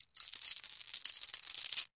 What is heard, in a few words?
Med Chain Lowered